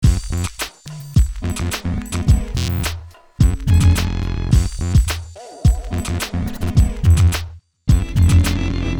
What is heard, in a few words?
dodgy arp